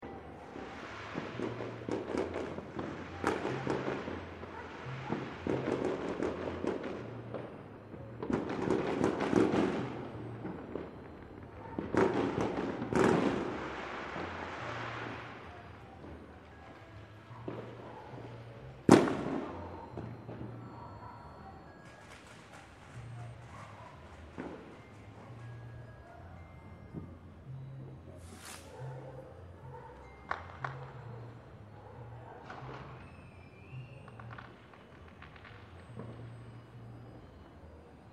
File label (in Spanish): fuegos artificiales año nuevo desde tercer piso con luces navideñas; SAN MIGUEL